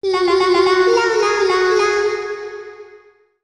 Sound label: Title Jingle; marl de jigsaw